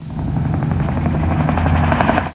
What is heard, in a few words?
H Copters; Mosaic Magic